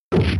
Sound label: punch; spainfighter